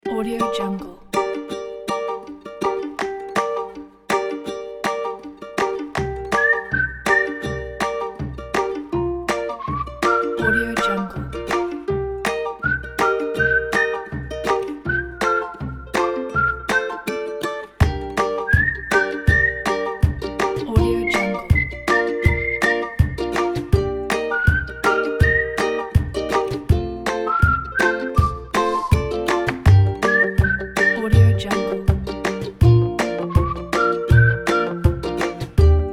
unplugged indie whistle

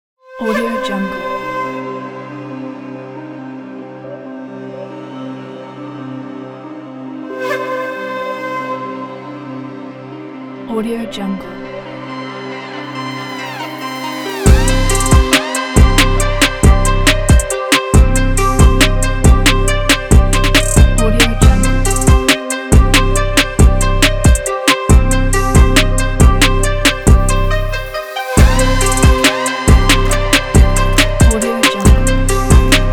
the japanese hip hop

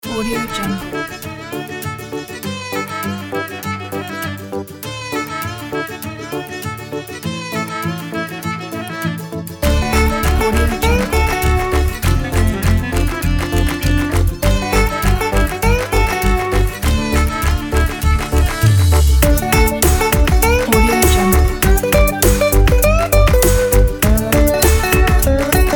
corporate upbeat positive

